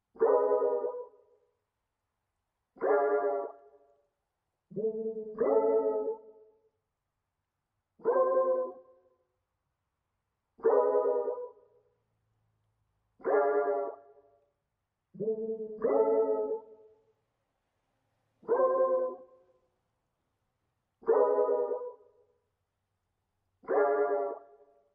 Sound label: 92 BPM C# Min Accent; Loop Stems; Cymatics; Ballad